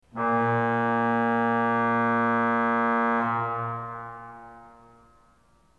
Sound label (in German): ton, orgel